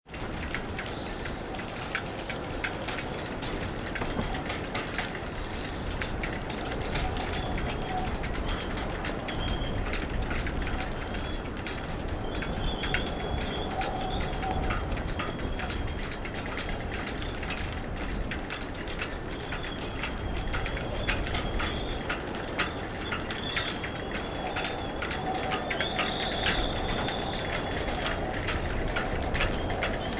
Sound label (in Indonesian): hujan mulai reda